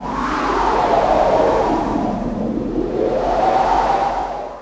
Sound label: Wind die; WindLence